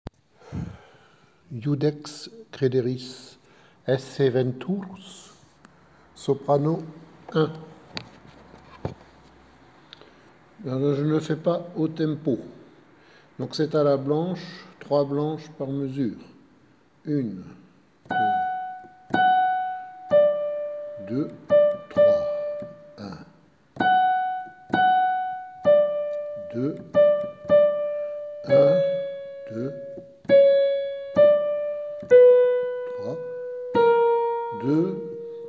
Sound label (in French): Choeur Soprani